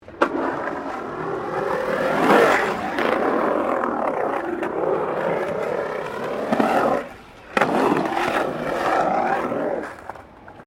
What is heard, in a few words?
Skateboard Skate Bowl Round Bowl; Skateboard sounds; Sports; Skateboarding